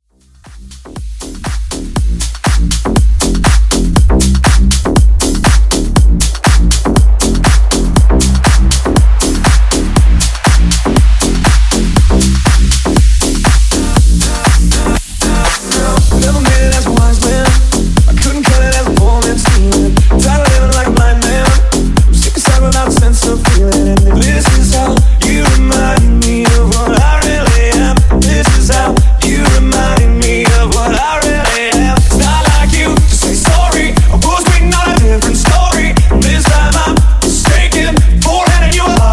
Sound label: Bootleg Rework 120 Bpm; remixplanet